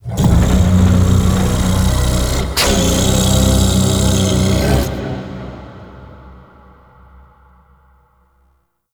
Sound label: Sand Vocals; Warframe; Man In The Wall